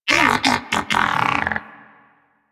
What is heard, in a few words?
Moa Pet Disagree; Warframe; Pets; Tenacious